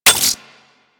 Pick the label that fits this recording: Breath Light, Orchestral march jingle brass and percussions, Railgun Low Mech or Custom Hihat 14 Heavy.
Railgun Low Mech